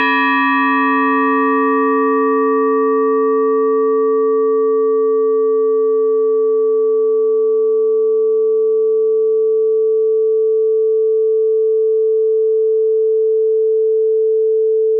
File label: single bell no decay